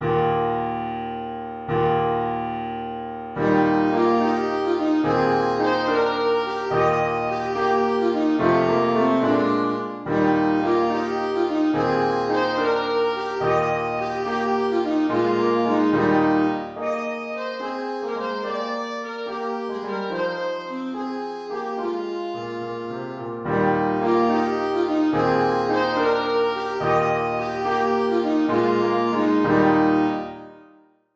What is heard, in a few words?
dance alto sax